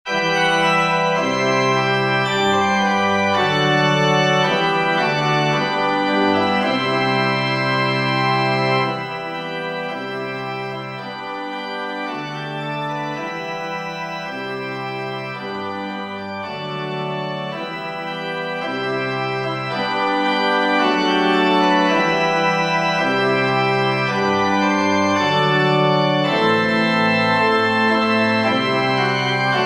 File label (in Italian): organo